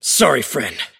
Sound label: deny pain; davion; dota